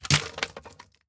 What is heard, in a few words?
plastic break